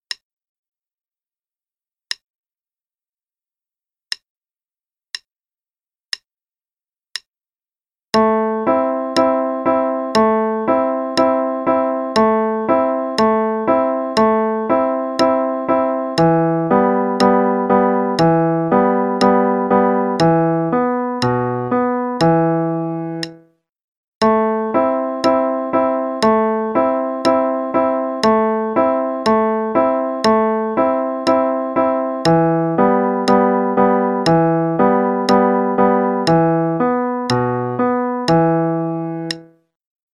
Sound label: Play-along Rondo alla Turca (LH only, qn=60); Mozart; Chwalik versions of classical music